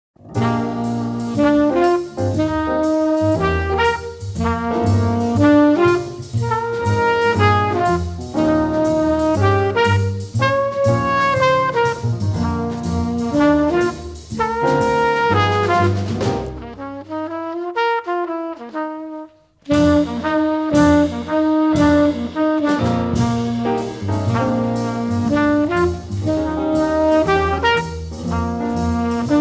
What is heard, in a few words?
Quartet, Quintet Version